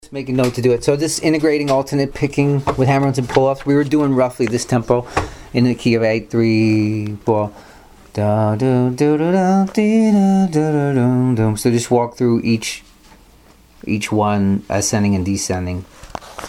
alternate picking with hammer ons pull offs

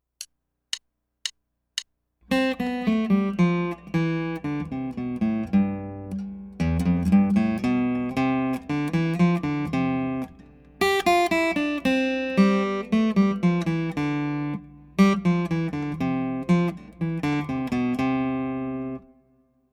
Scale Studies

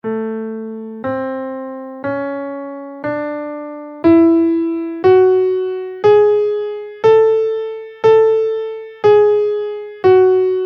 ionian 2 scale in A, guitar

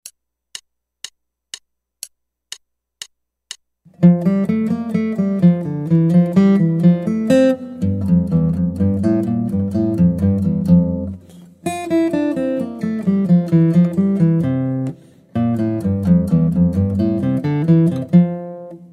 Guitar

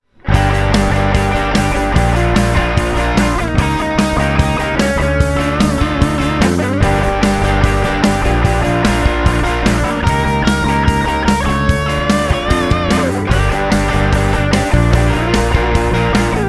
romani minor

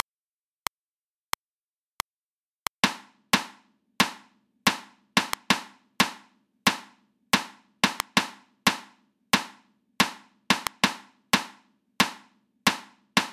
reversed rhythms 16ths at 90 bpm

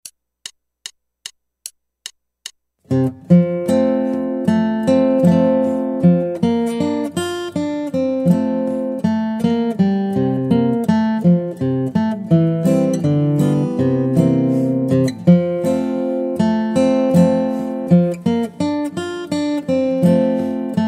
Guitar

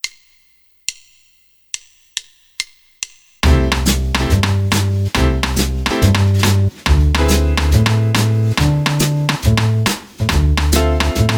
claves on

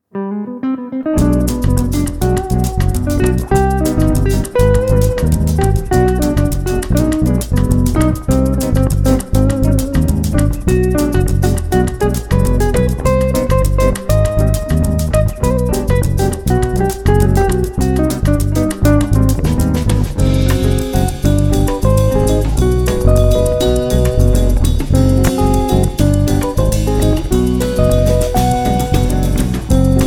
ultraphrygian

